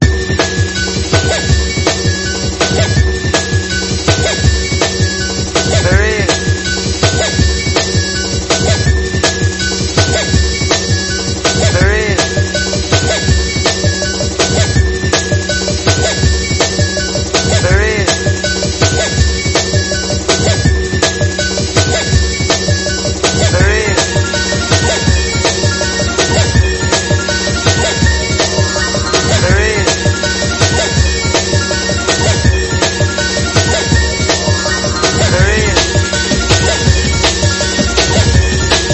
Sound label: breakage